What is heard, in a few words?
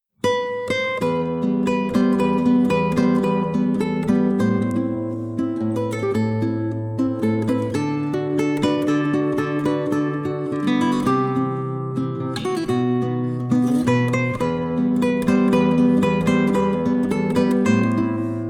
classical guitar